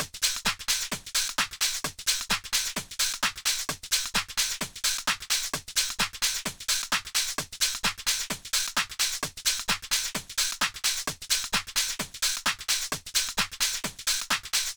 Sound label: House Loops